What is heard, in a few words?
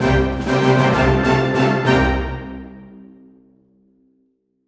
Jingle Prebattle